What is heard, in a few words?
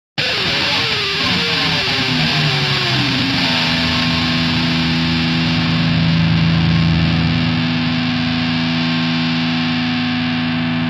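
Electric Guitar Plectrum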